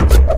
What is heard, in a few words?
ministrider footstep